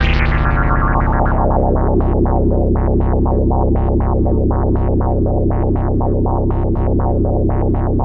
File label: analog, special fx twisted; USB Soundscan; AKAI